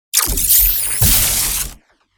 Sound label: Unleashing raw energy, shockwave